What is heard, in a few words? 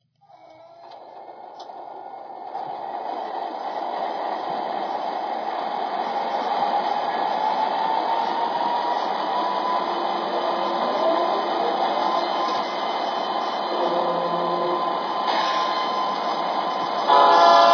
ES44, trains